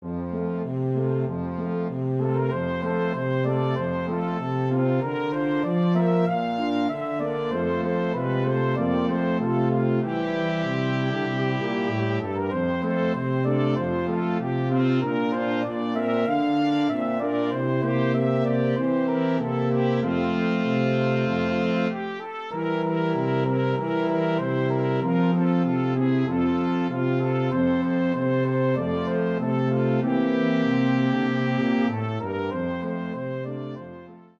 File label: Brass; Northern Set